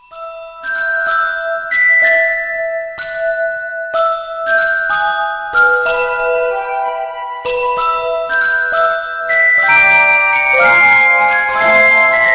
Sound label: instrumnetal ringtone; harry potter